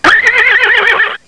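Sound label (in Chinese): ma jiaosheng, horse neighing; jiachujiaosheng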